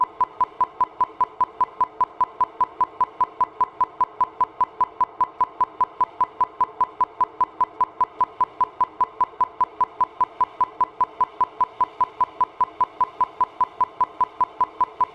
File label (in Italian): burst 32ms 1kHz; LaSpezia; 30V; S1 trapez RCF Vecchia; Mic2 guida; CESI